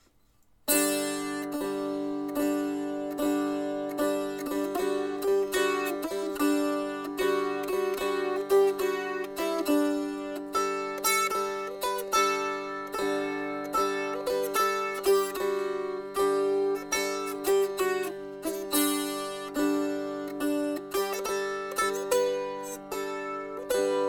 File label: dulcimer